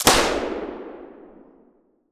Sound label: bow; Minecraft; create-astral